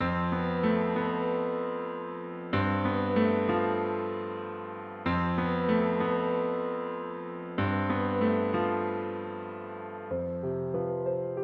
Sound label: Bright piano solo to match drama and anime BGM